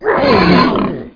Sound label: Monster; Conquer Online 2.0